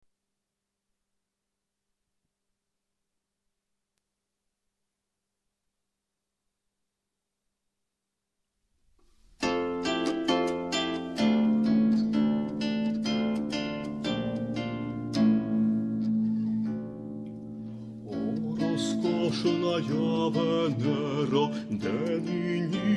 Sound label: Ukrainian baroque love song